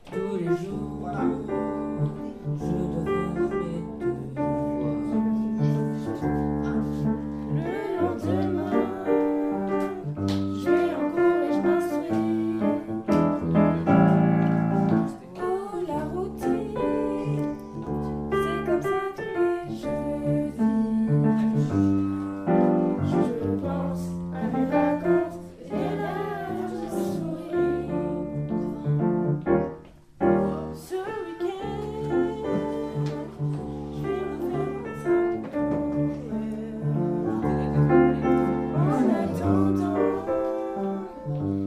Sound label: jazz